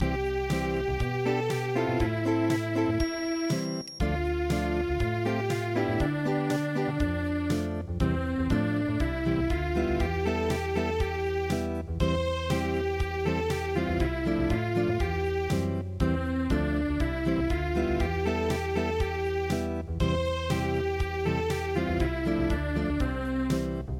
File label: Piano Unplugged